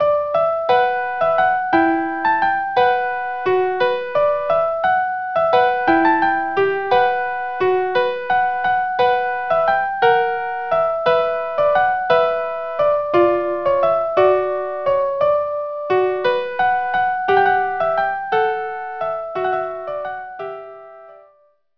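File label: canzonetta tarantella violin viola; Rimsky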